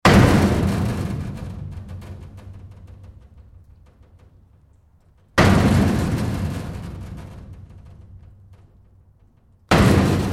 Huge Metal Door Slam and Vibrate various impacts; Prison Door

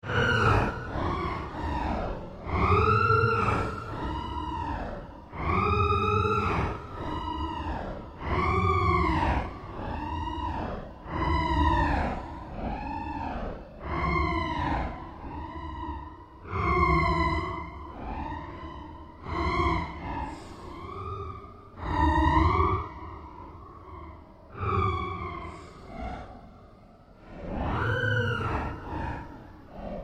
Created Cyber Whale Sequence, Alien Sound Effects; Created creatures, vocalisations and pretend speech

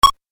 Retro Pong Tennis Hit; Video Game Sound Effects

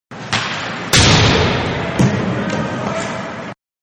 Hockey Ice-Puck Hitting Glass; Sports; Manhattan Production Music

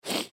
Human Sniffle, Sniffle Stuffy Nose; Sniffling; Blastwave

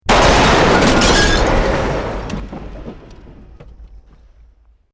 Building Collapse Medium; Created dramatic effects of buildings collapsing or breaking; Construction

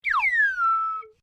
Whistle Slide Down, Simple slide whistle

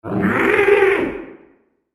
Ghastly Gargoyle, monster sound effect; fantasy sound effect; Andrew Fleming

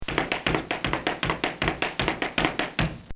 Tap Dance Steps

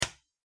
Plastic Ball Bounce; toy; McKinney Sound

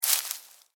Footstep: Individual Footstep On Dry Leaves

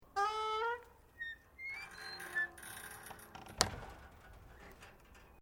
Door Wooden Gate Old Squeak Slam; Gates

